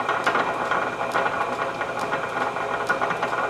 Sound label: Pipes; zps; Ambient